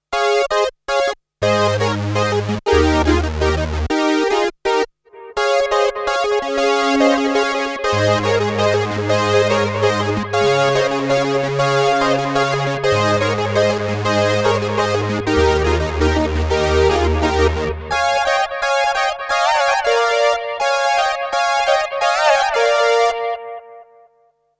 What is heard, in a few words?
Strymon Time Line Bucketbrigade Synth